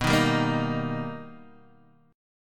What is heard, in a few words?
B Augmented Major 7th B Guitar DADGAD, chord